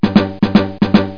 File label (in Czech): buben